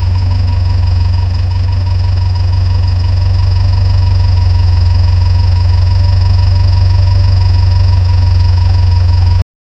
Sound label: the low steady humming so, truck